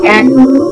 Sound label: fall; quake2; martian